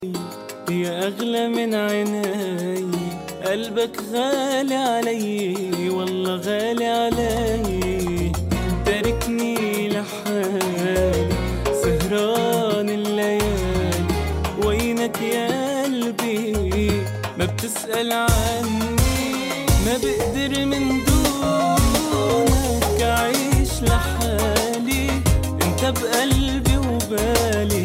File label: Arabic Love